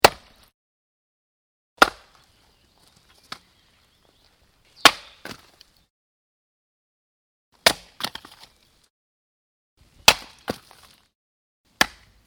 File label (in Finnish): Kirves